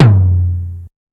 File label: Tom, dx